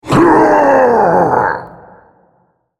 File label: ultimate effort; Hecarim